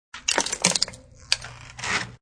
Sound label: Twist of an aluminum can; Twists